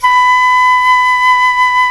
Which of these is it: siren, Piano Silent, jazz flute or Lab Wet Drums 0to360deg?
jazz flute